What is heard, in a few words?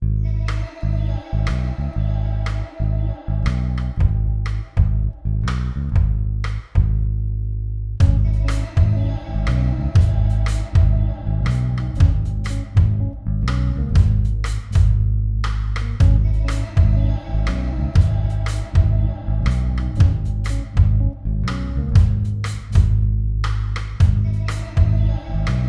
BEAT